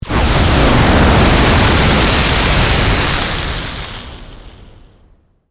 Meteor Atmosphere, Scifi, Meteor, Break, Atmosphere, Pass, By, Science, Fiction; Sci Fi Sound Effects; BlastWave